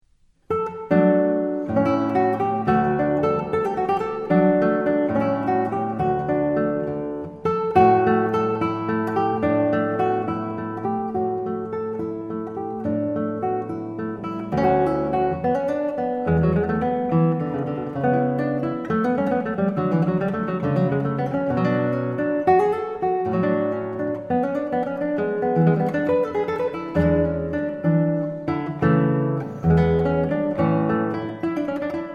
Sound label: gigue bwv 1012